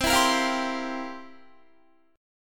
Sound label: C 11th C down Guitar; strummed